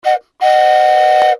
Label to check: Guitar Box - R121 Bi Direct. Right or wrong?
Wrong